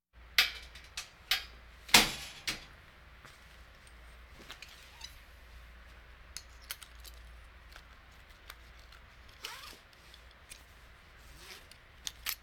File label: Hospital effects